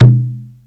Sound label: LOW CONGA; E-MU Formula 4000 Series Vol. 1; Hip Hop Nation